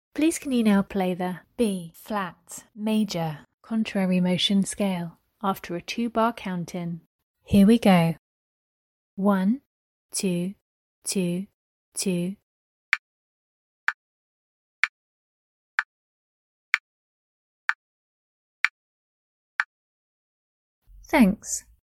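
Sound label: B flat major contmotionscale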